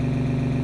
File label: engine drive; simpra